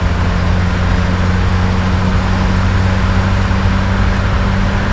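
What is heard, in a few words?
car; autko; Mafie 1 cz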